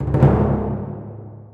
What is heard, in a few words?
Timp rollin